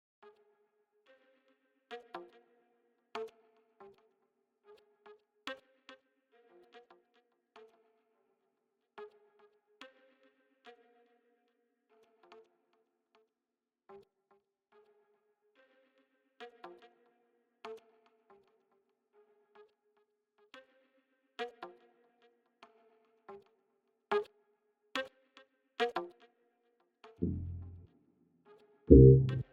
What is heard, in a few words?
Slappy Saxs